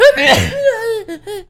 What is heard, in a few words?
short sneeze